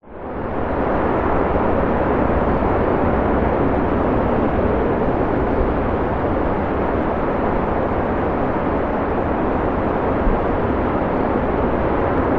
nature ocean surf distant drone; zapsplat

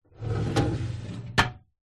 office metal drawer open; zapsplat

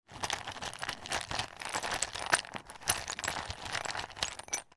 office person rummage pot of chalk sticks

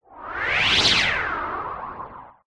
sound design sweep white noise filter

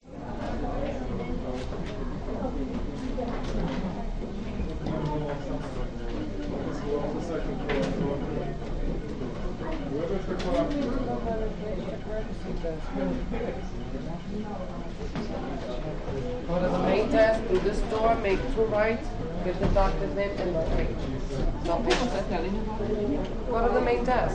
Hospital; audio hero

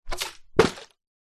bottle flip plastic water bottle throw flip land; zapsplat

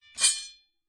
warfare sword draw

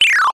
multimedia game throw drop tone; zapsplat

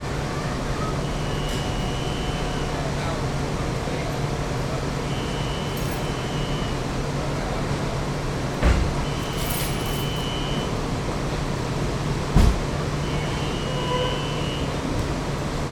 transport boat ship ferry car deck short ambience

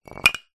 nature ice block small set down

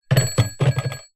household alarm clock with bell set down table

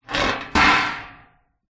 metal baking tray down on surface; household; zapsplat

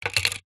household spectacles glasses down on table

